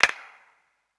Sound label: clap; eddyflux; dough-samples